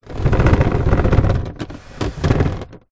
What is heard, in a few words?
household table plastic garden drag on wooden decking